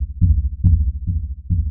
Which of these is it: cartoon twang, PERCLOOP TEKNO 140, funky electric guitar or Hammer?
PERCLOOP TEKNO 140